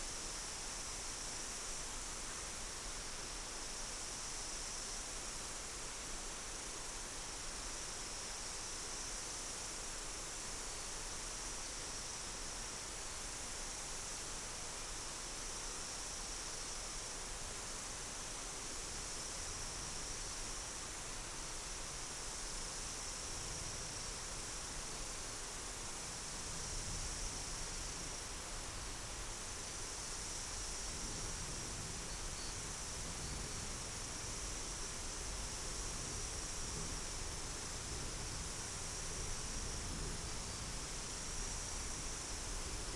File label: mainly insect cluster